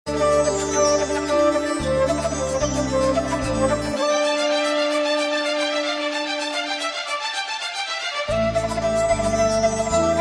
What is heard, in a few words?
violin flute tabla; Electronica